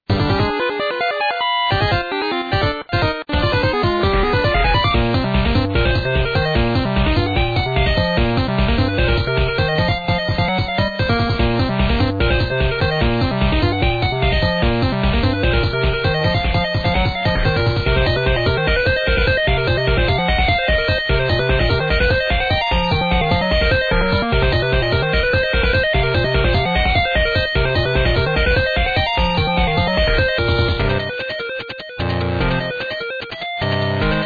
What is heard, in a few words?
rpg battle